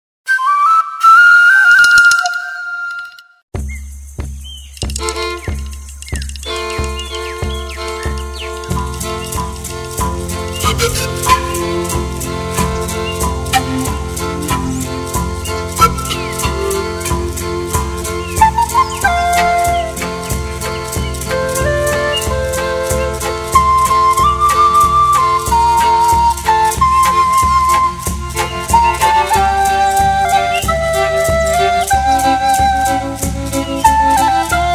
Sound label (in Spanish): Indigena Instrumental Flauta